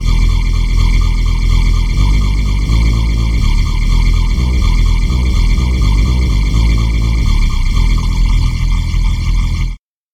warm pad bass

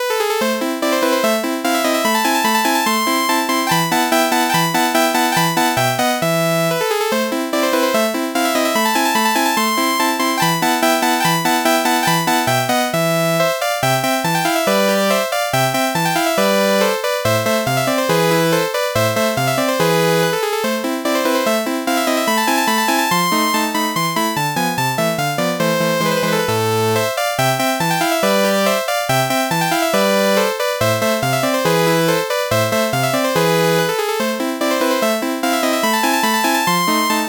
fifth sawtooth wave

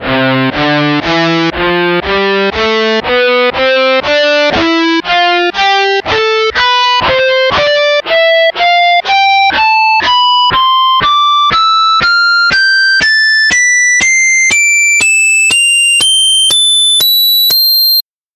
guitar harmonics tonleiter

